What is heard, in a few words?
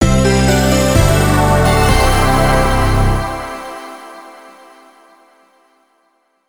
Moderate Fanfare; bejeweled twist 2008 pc gamerip